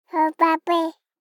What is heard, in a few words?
BABIES TALK Boy 12 months Ho Ba Bey; Baby Boom